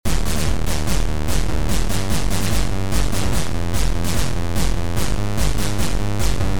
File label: Beat; Mutant